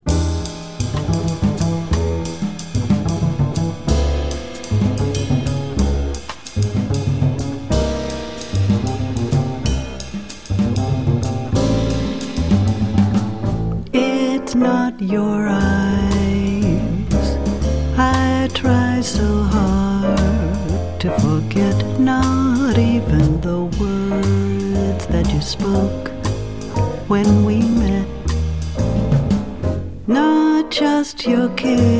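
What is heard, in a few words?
vocal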